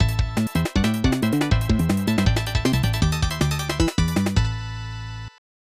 Win Match; Shaq Fu; snes

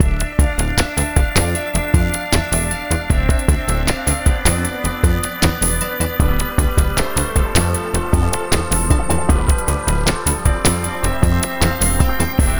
music

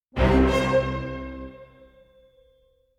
Orchestral Hit

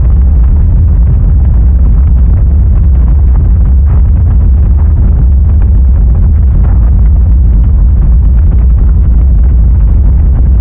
Lava Plume; Nicktoons Nitro; arcade

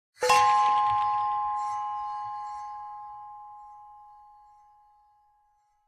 Bells

